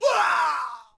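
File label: Longbowman Die Vox; Civ4 warlords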